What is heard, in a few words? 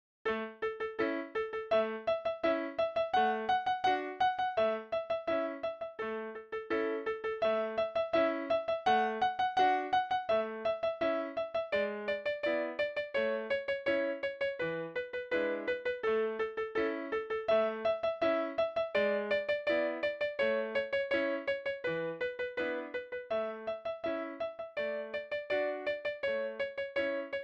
Twinkle Var C